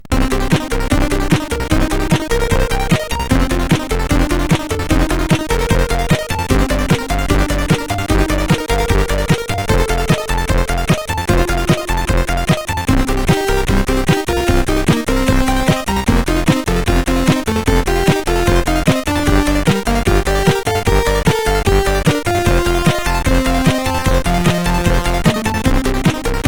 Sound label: Humming a Cheesy Melody, CSG 8580; CSG8580R5